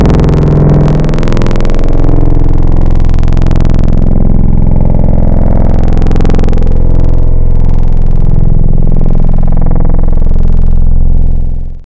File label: Dist d00t; Digital; Synthetic's Serum Collection